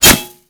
claymore plant; Modern Warfare

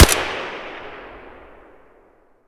sks fire distant; Escape from Tarkov

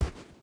footstep rubber boots sand jump land; Rust

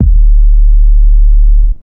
wave hit player

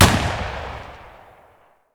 mr43 double fire far; Escape from Tarkov

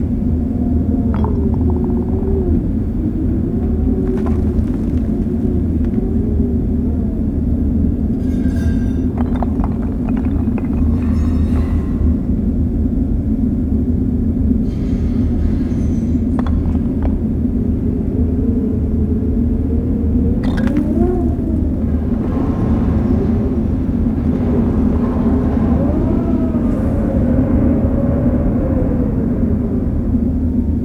ambience office

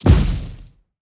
impacts; World of Tanks

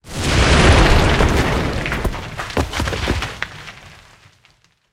tanks explosion ground; explosions; War Thunder

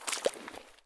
prone puddle; Escape from Tarkov

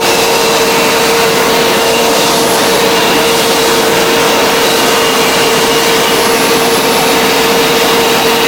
Aircrafts A-10 APU; DCS

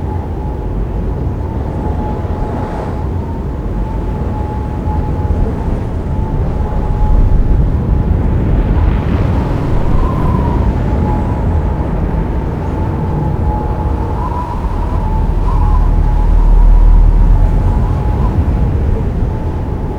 ambience terracotta

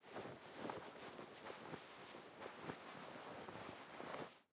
wraparm; Rust

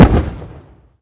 Inf Weapons; Red Orchestra 41-45

